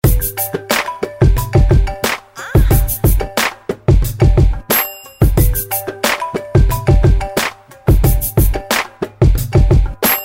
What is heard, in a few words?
Iphone Funky